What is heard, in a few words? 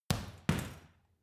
boxing punching bag side hook double hard; sport freizeit; boxen